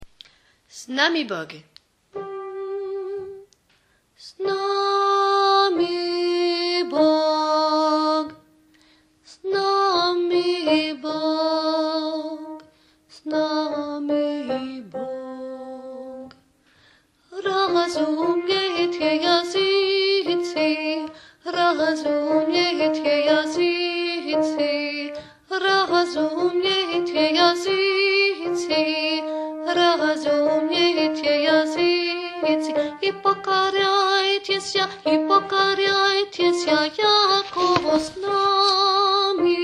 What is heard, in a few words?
snami bog, alto